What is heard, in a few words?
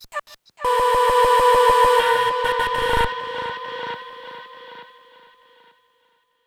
D Ahh Verb Glitch Del Fx